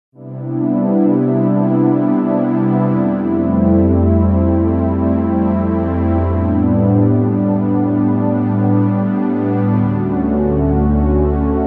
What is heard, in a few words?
pad